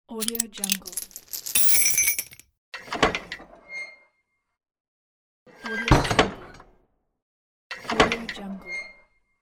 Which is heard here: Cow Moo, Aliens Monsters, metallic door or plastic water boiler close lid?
metallic door